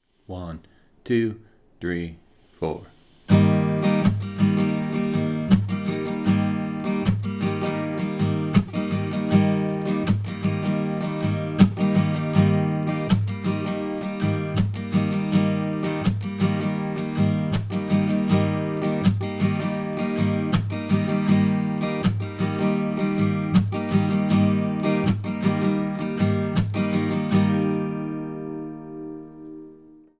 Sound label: strum pattern